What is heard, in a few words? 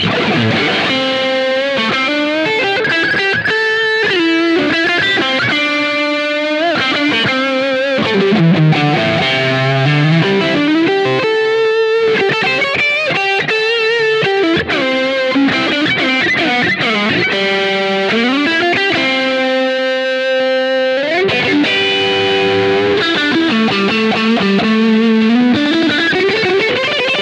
Fender Lead II All Positions Lead